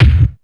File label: bass drum d key; Duration 0:01; Samplerate 44100 Hz; Channels Mono